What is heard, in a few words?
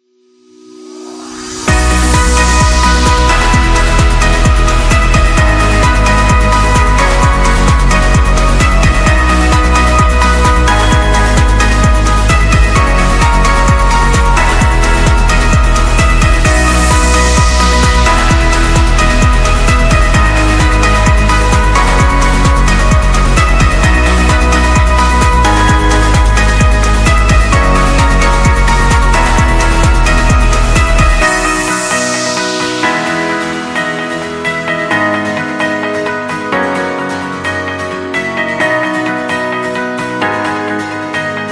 Pop